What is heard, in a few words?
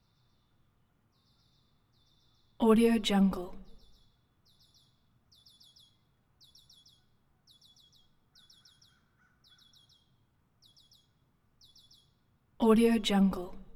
chicago prairie air birds tweeting; prosoundfx